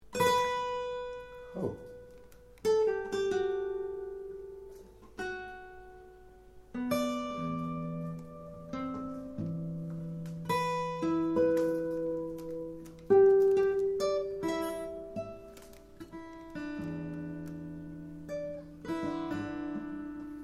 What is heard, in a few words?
Trio Impro